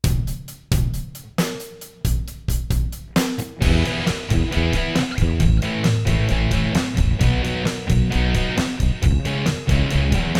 Rockin Pop

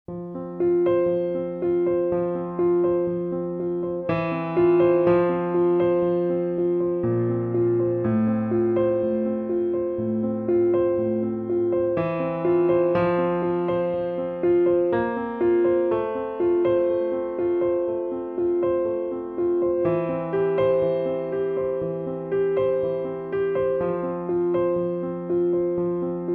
Yearnings (solo piano)

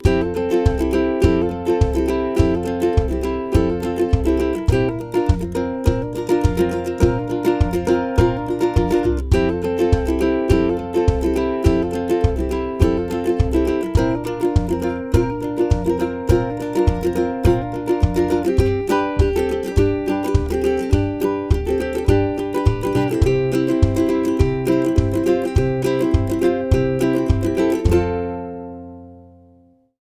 Uke, no melody

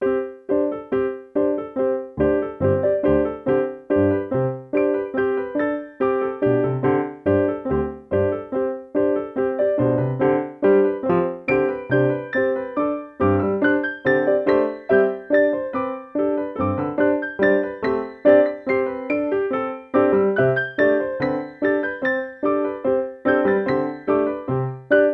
Piano Pulse B (solo piano)

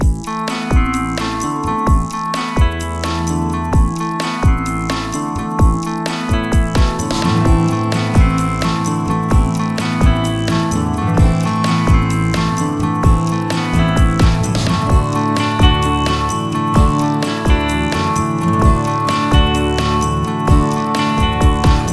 no cello

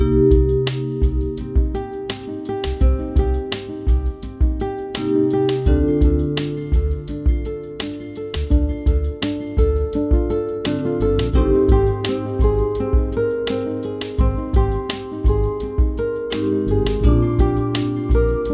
no melody

